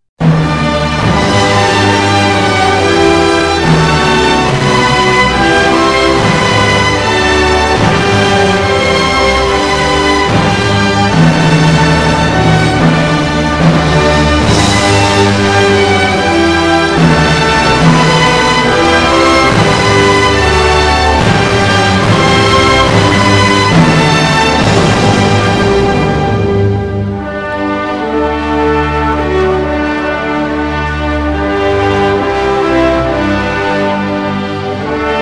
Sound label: National Anthem; Vishwamitra